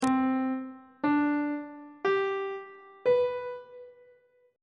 c major 7th suspended 2nd chord intervals on treble clef